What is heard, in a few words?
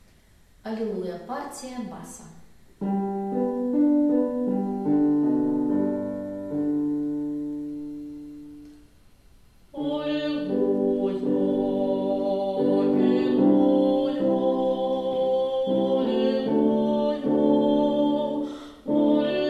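Alleluia bass; Allilujya; epiphany 2016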